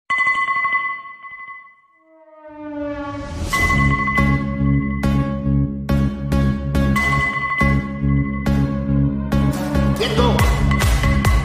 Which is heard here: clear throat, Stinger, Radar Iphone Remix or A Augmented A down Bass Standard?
Radar Iphone Remix